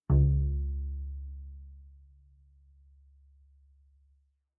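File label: Bass pizzicato fortissimo sul A Db2, Double Bass; MIS Pitches - 2014; Strings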